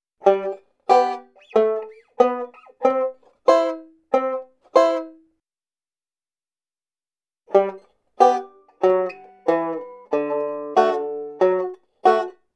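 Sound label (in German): diatonische passing lines, banjo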